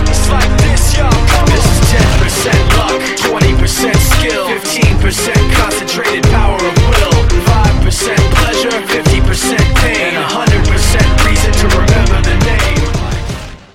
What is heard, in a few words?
electro; roundsound